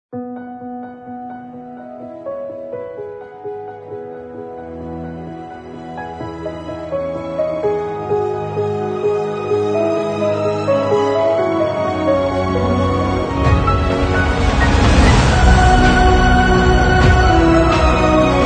Inspiring and Uplifting Cinematic Trailer

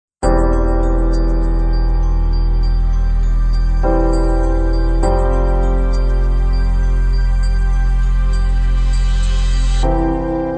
Inspirational Opening Ident Logo, bpm100

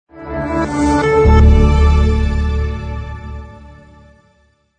Particle Logo

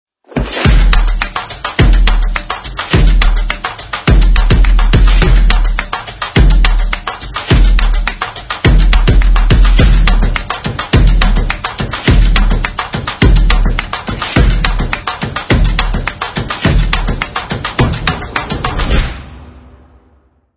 Stomp SHORT

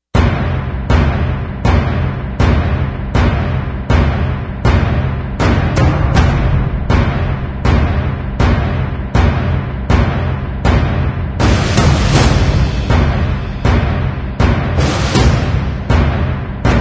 Drums

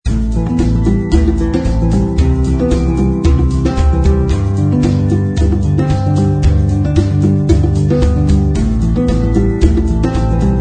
Verse NoPiano; 夏日温馨套装 Warm Summer Kit

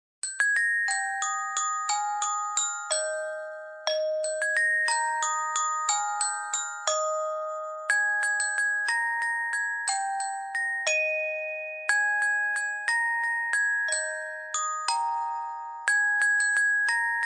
Christmas Carousel Bells Intro

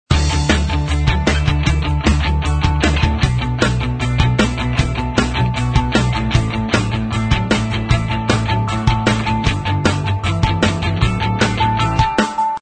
A Drive To Remember Beginning Loop

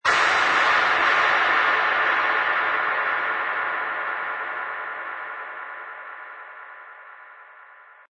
FX Essentials White Noise Downlifter; Cymatics

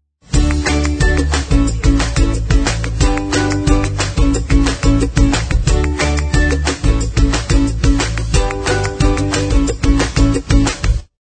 Happy Kids Music Loop

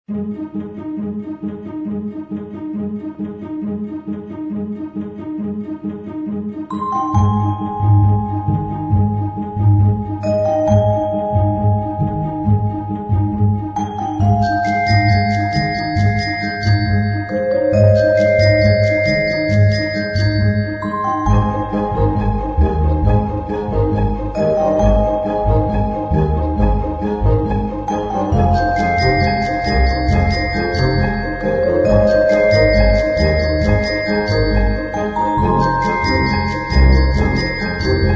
Quirky Toymaker short

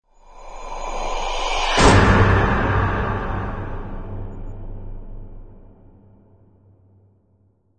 Poltergeist

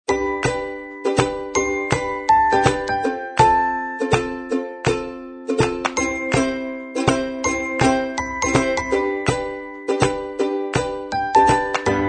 Joyful Morning

